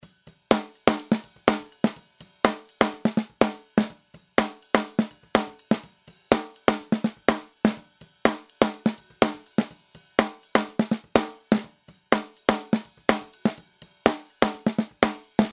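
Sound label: snare uad1176 comp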